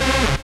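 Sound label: Rave Synth, Dance Mega Jungle Rave; Best Service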